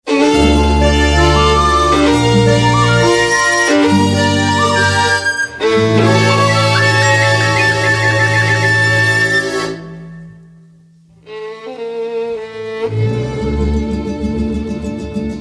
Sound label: czardas